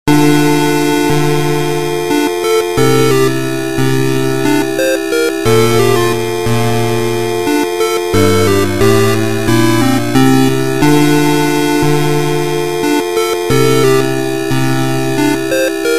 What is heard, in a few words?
gameover, wavetable; nes; tod